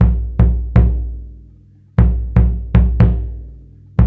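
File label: Busy Drum Whistle, African Contemporary; Spectrasonics; Supreme Beats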